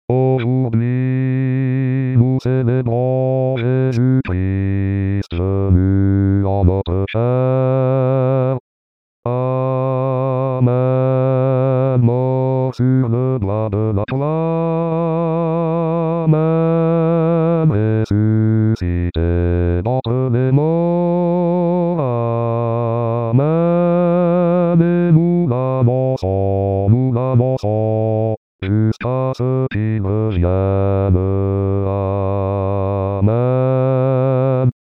Basse, Chorale; Paques

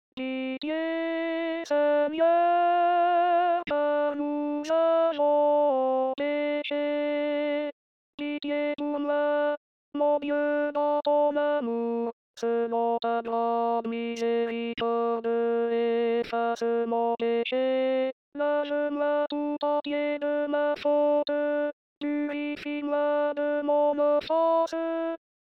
Pitie Seigneur Car Nous Avons peche, Chorale

